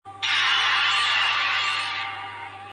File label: roar; Riseross